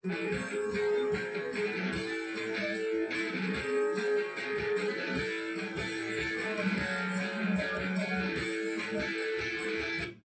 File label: drums; SiSEC11; underdetermined